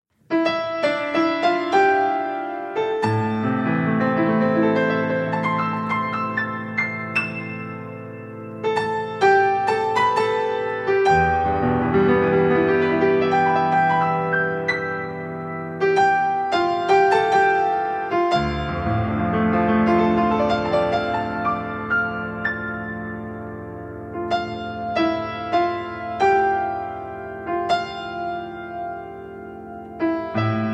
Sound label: Piano Tar